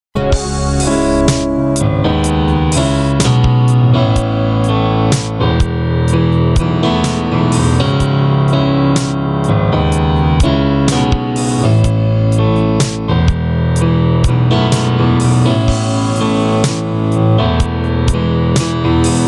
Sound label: ballad